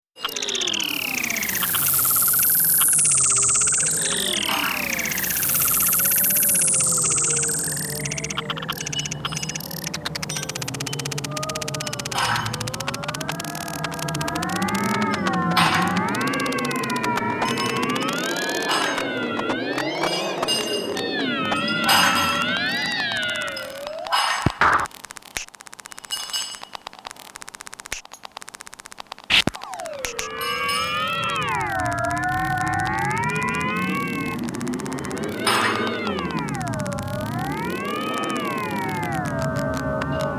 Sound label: Cowshed Neck Rupture